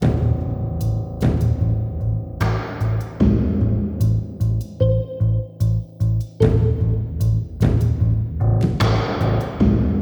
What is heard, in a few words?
Dark Dungeon AMBIENT Loop; Eternal Edge